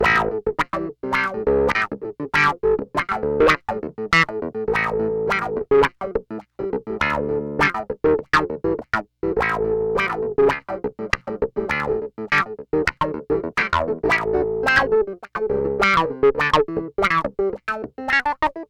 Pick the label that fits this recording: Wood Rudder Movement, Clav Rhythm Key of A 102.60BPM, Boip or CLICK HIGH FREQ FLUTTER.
Clav Rhythm Key of A 102.60BPM